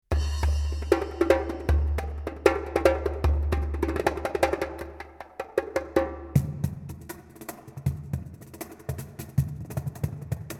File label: percussion solo